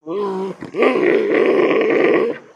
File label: Pets Bear Panda Angry; creatures; Lego